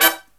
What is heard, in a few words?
HI CHORD, Brass